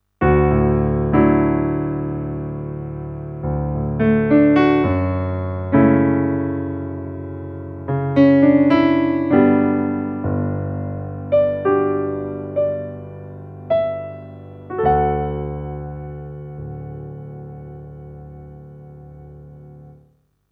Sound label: Casio CDP-S360 Grand Piano